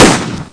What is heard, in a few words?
minigun